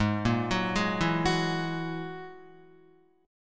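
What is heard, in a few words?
A flat 7th Suspended 2nd Sharp 5th A flat Guitar, chord; Ab7sus2#5; arpeggiated; 4E,4A,5D,5G,5C,6F; chordshape; 4,1,4,1,4,1; JGuitar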